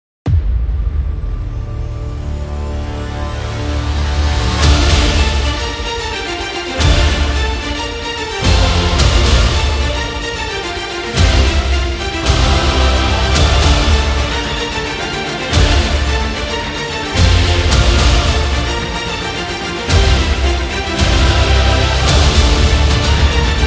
Epic Event

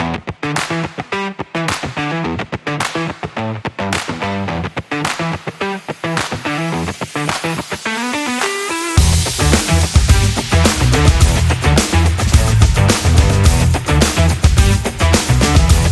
Cool Groove

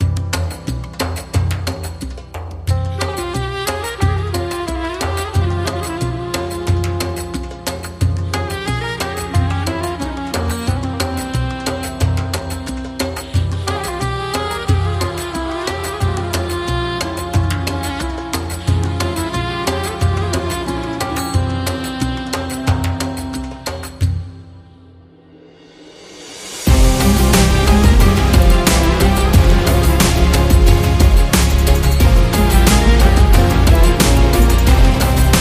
Middle Eastern

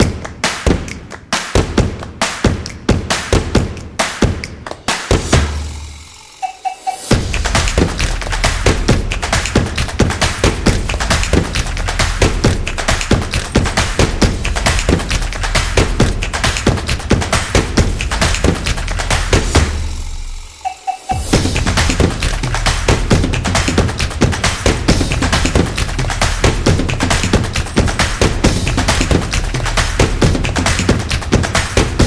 The Percussion Music

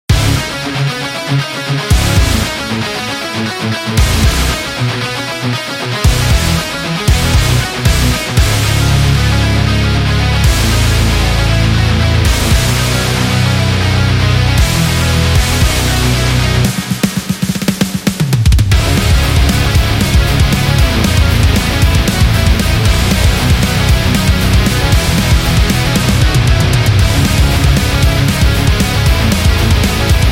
The Heavy Metal

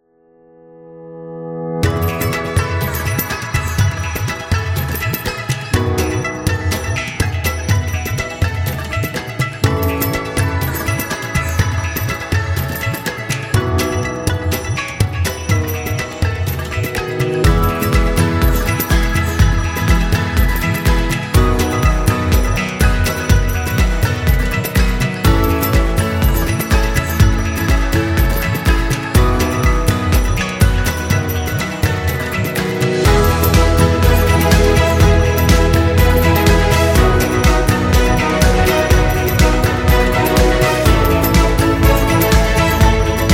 Indian Wedding Invitation

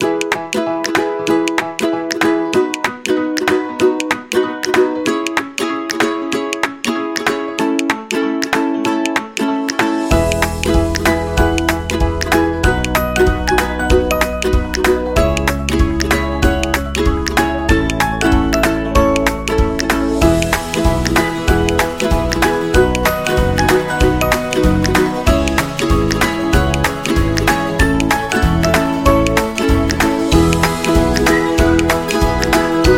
Folk

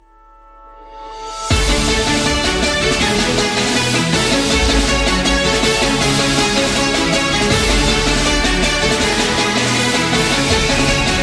Sport Action News

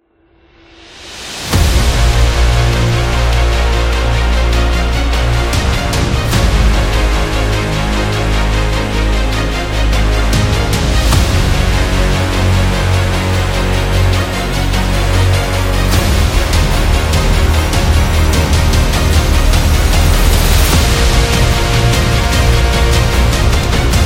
Heroic Epic Trailer

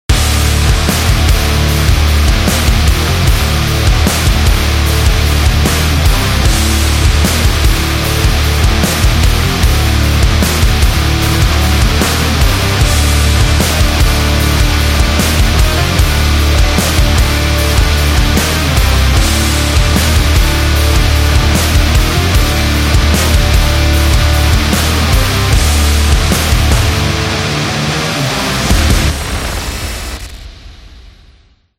Heavy Metal Action; sports training energy

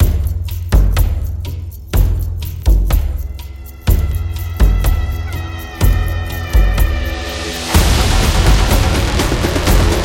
African Tribal War Drums

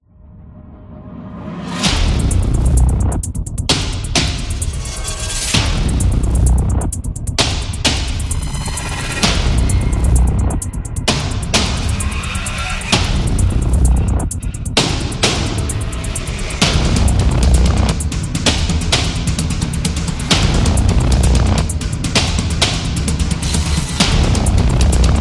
Epic Hybrid, battle background music

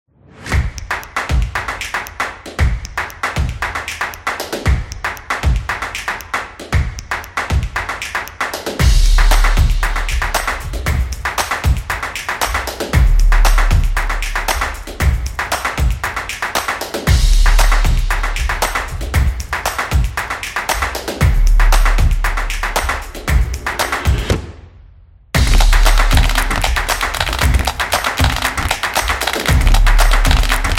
The Percussion Background; high energy

